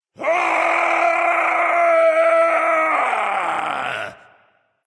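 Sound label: nonverbal battlecry; smite; voicepack; ullr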